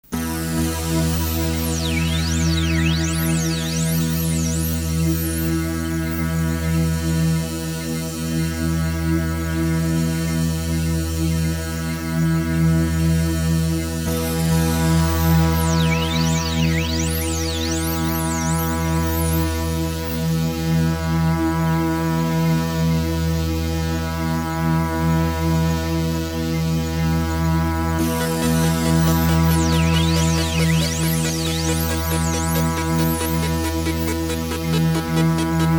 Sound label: Soundscape